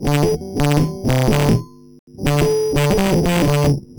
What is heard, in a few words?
Guitar Loop, 120BPM; musicradar; bitcrushed; Crushed Loops